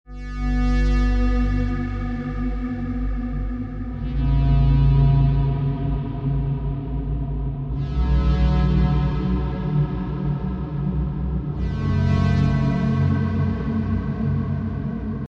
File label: Pad; PatchFactory